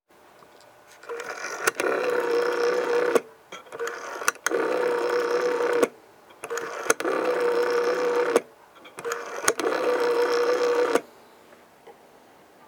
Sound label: dial sound